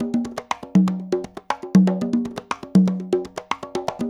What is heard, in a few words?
LOOP, CONGAS, Percussion